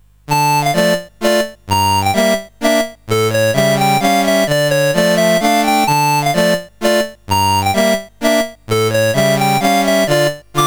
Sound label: polka mazurka, mus CSG8580R5